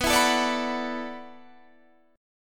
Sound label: E 7th Suspended 2nd Suspended 4th, B